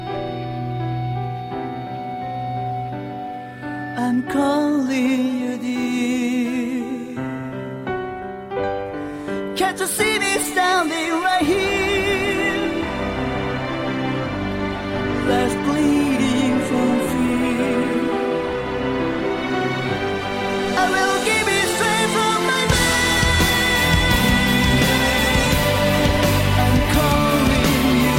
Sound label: solo piano